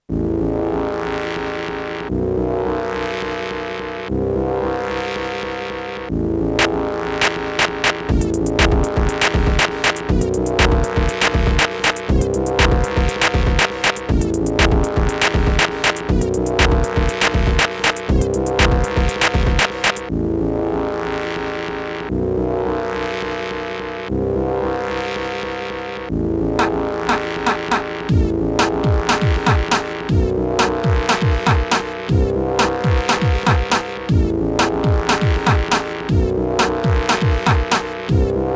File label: three beats